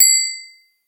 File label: submit; frappe